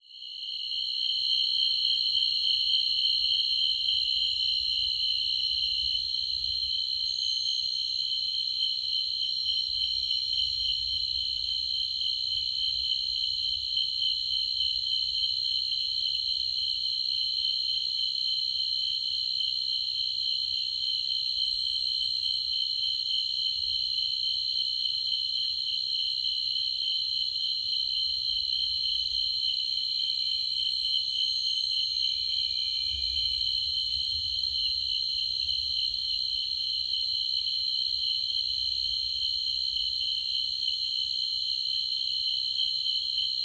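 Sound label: sounds of restless crickets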